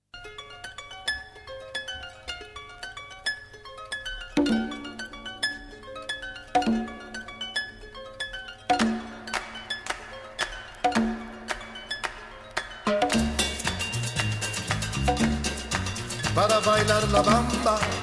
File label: La Bamba, Phase4 Stereo